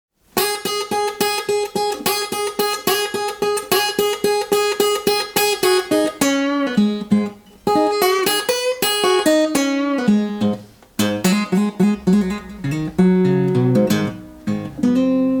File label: Blues; Blues Book 1